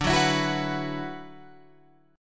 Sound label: D 6th Add 9th D, Guitar, Open F; D6add9 strummed; 14,13,16,13,14,13